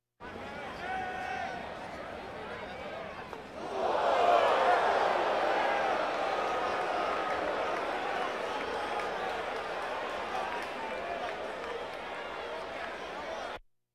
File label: Crowds Race cars Baseball